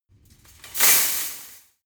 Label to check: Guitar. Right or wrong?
Wrong